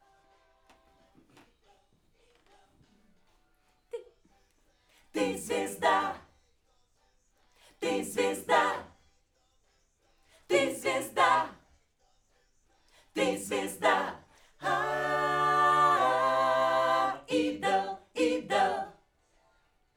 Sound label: Backs AKG